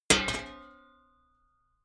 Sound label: sheet ring